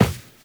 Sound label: Run Default; Footsteps; Warframe; Characters; Tenno